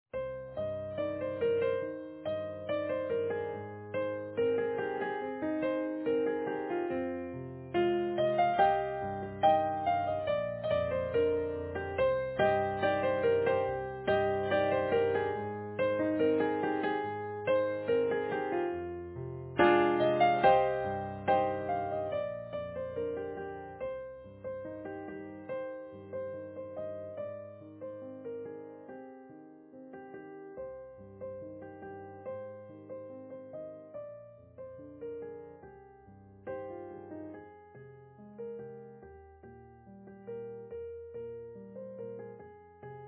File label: easy piano